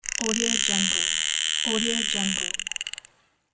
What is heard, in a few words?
dolphin clicking sound